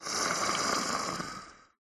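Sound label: lizard shaman stun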